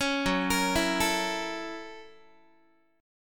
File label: F sharp 7th Sharp 9th, Guitar; F#7#9 arpeggiated